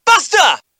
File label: buster; shulk; potry v2